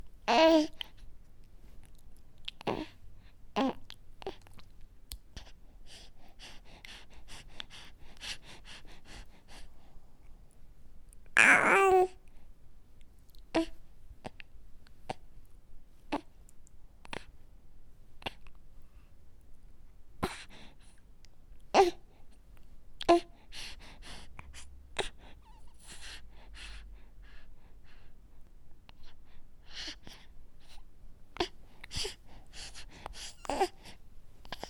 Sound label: baby working